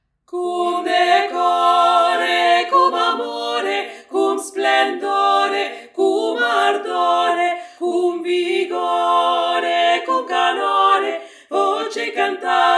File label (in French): 3 VOIX; CUM DECORE